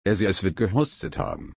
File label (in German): husten